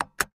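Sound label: computer spacebar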